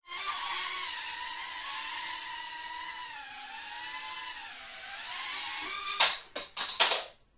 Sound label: Drone; fox audio